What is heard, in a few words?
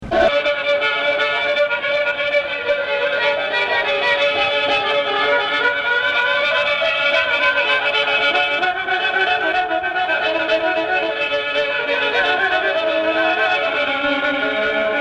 viola; flatdisc